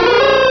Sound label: cry; source of the sovereign